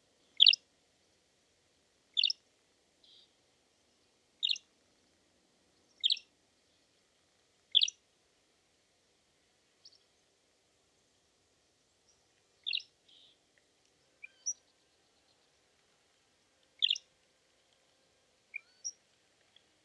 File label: Iberian Grey Shrike Lanius meridionalis